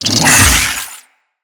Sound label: Infested Big Ashen Melee Swing C, enemies